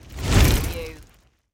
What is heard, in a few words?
Fireball Pass By Heavy